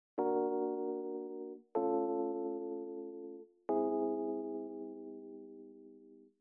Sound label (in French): VIRGULE WURLY